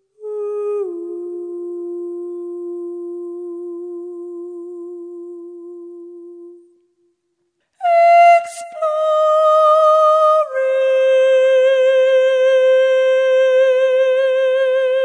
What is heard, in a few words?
Vocal